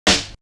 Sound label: Snare, Drum One Shots